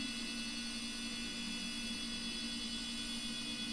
imac idle

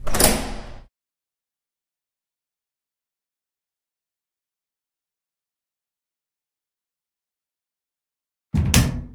door seq; blizzard

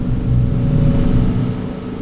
hum

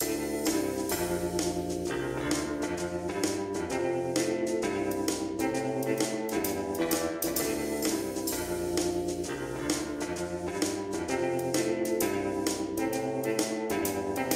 music; blizzard

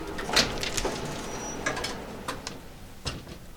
elevator close

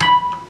elevator button press

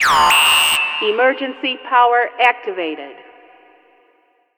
emergency power; blizzard

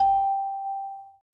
ele start; blizzard

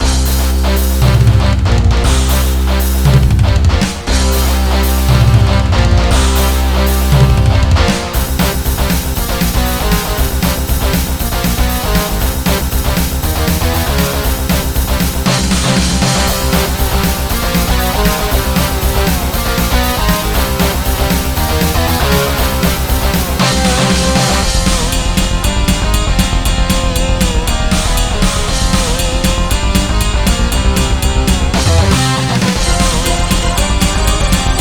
metal; blizzard

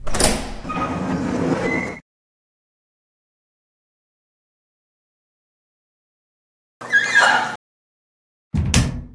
door seq

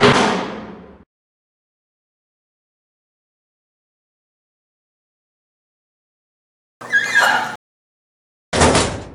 bar door seq

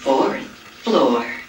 floor

